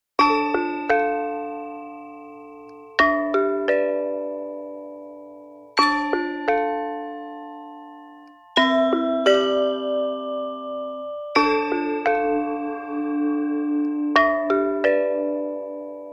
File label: Melody Gongs and Bells